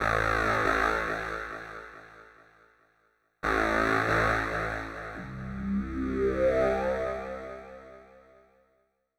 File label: HARD EXTRA 140, Hard Trance